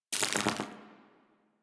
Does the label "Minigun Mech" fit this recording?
Yes